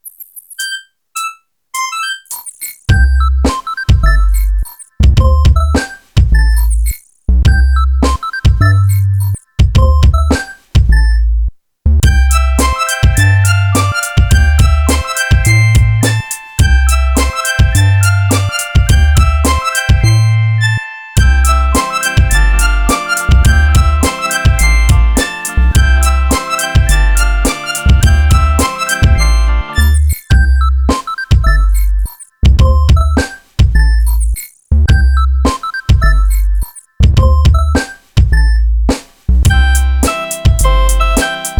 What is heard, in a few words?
i-mobile Ringtone Hiphop; i-mobile i-STYLE 216